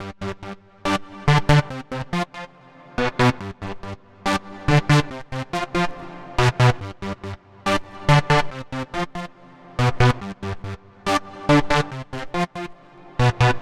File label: Bpm141 Lucky Synth Loop G; Dubstep; FX Loops